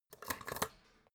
folder clamp flip

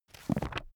book browse smooth

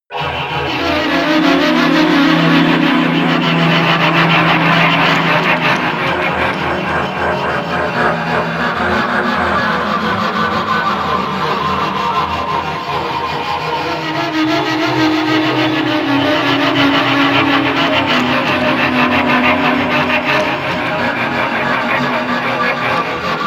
atmosphere alien scrape

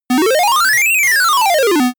general rise and fall short

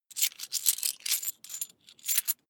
coins big clatter fast

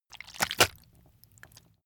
intestines slip

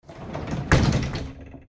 door old close

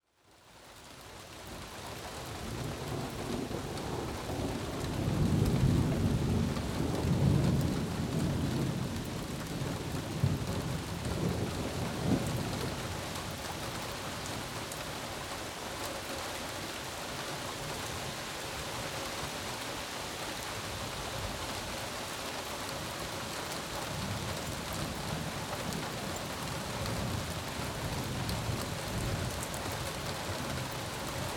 thunder and rain

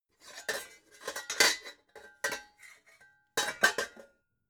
pots handle

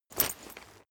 leather metal walk normal single

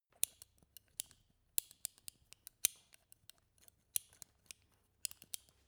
scissors handle

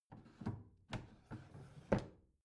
bathroom drawer open soft

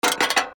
machine burst

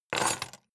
key ring large place table hard

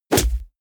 heavy punch

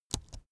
keyboard laptop space press slow soft

